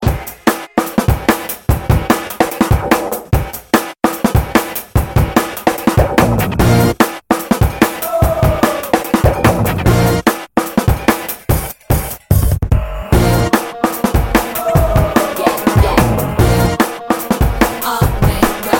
Drums Rhythm

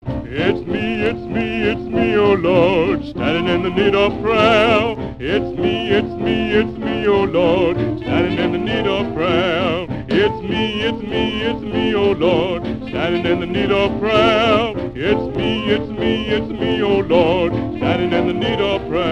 gospel

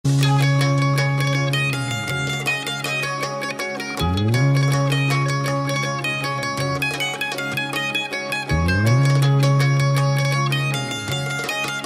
Lovely Guitar

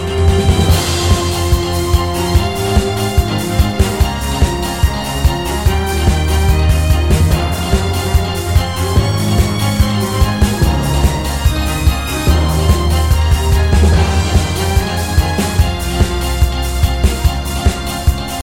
Orchestral

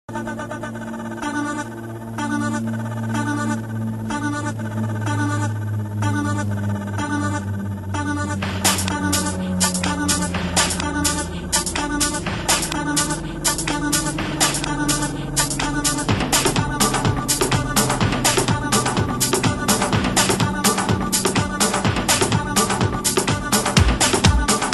Rocking Music

